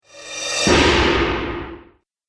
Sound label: Strong Metallic Swoosh Sound; Whoosh